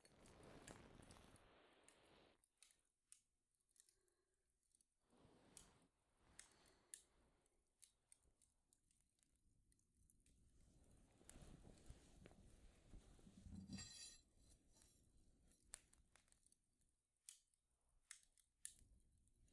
囲炉裏ぽすぽす; 初日・囲炉裏

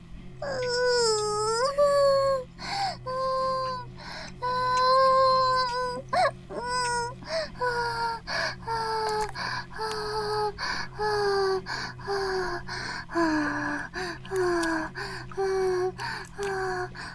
バイブ放置; イクたびにわけて

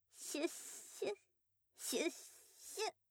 シュッシュ

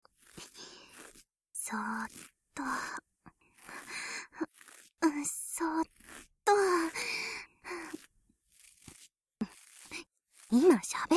左耳 耳かき

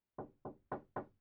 ノック; 動作系

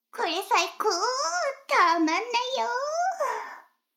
シコシコ音声; 逆時間停止されて

